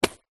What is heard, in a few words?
purse pouch leather containing coins and notes drop carpet; foley